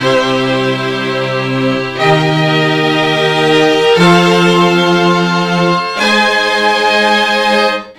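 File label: STR-CHORDS, string chords, Disco; Best Service ProSamples vol.48 - Disco Fever; AKAI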